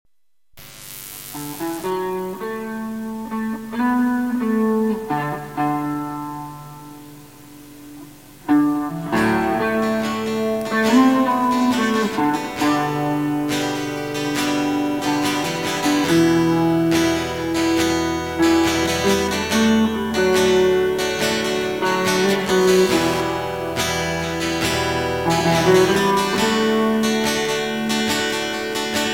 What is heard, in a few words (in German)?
Intermezzo Duett instr